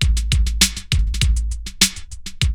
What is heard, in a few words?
LOOP; E-Lab; X Static Goldmine 2; akai